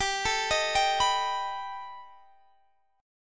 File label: B Augmented 7th G, chord